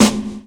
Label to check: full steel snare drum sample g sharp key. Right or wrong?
Right